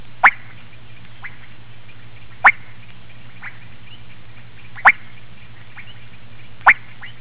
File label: kassina maculatus, frogs